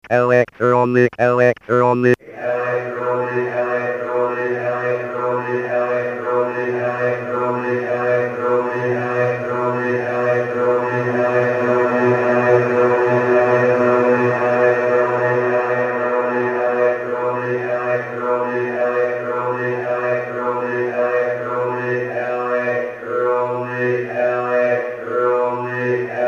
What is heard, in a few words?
grand reverb